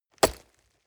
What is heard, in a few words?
nylon leather hit hard